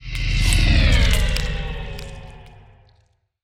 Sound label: ant ship juggernaut move; biogenesis